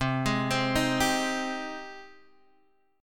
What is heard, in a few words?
Ab Major 7th C